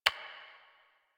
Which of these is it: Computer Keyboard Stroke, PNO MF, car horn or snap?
Computer Keyboard Stroke